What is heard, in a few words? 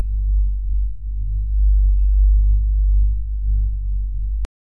a continuous steady celes, celestial